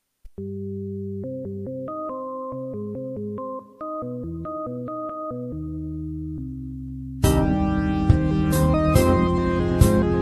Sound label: song